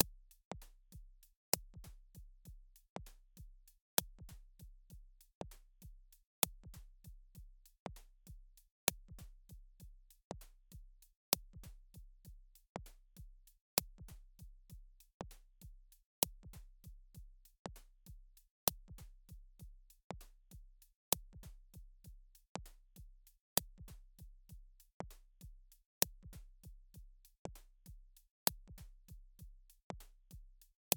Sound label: Eventide H9000 Drum Omnipressor positve Function